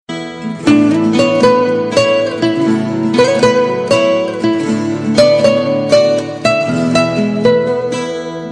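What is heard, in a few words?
Guitar Tones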